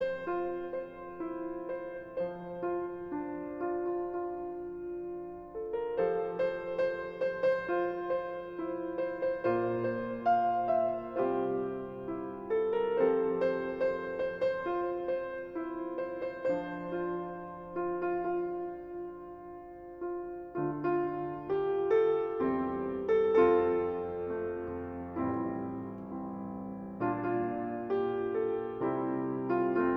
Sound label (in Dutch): instrumentaal